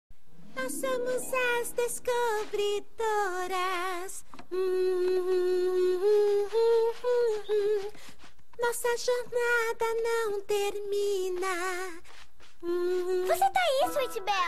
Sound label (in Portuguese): Humming, Brazilian Portuguese; Cutie Mark Crusaders; Season 1